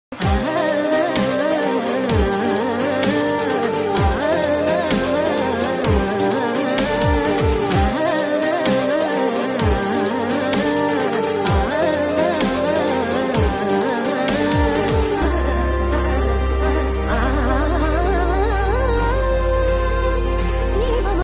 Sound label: indru netru naalai bgm humming love song